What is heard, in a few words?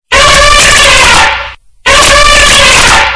sad elephant